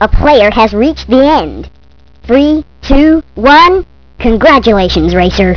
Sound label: ending; kart race